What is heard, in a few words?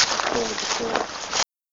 cemetery